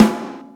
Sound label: Snares, Real